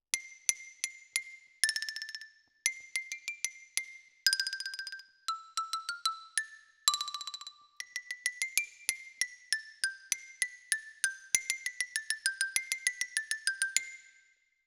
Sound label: Xylo Close